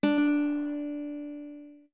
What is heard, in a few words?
Notes Guitar D long